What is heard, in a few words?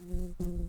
Humilis workers, bumblebees